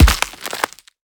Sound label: Background Otter eat